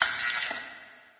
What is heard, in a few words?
light flicker; Source Engine; Portal 2